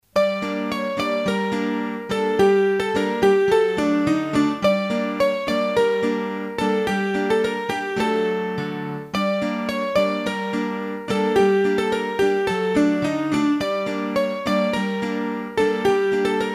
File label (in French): Bourree de Concours, hurdy gurdy; Bourrees a 2 Temp